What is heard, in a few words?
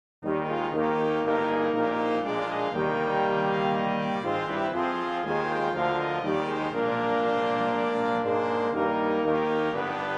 This is BRASS